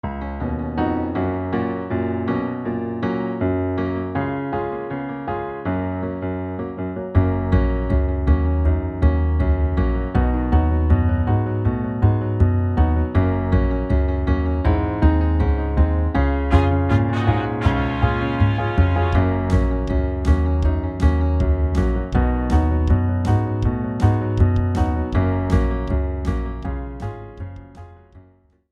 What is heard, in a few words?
Instrumental